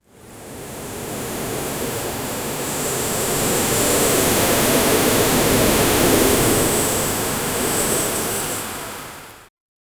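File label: wind blowing grains slowly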